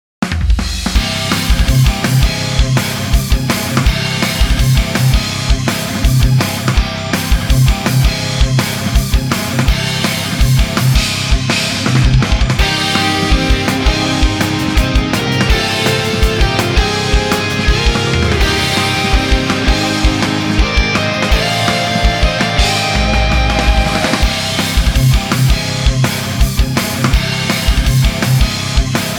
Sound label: Battle BGM; GITADORA EXCHAIN